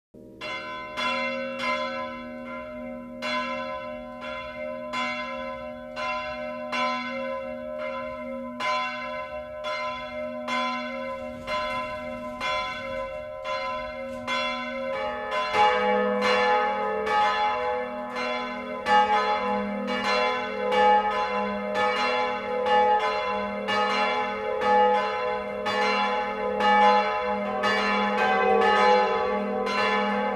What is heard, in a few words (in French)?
Cloches; noel